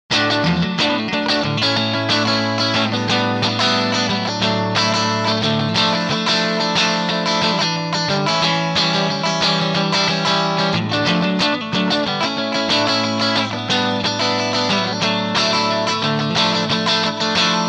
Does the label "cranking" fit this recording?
No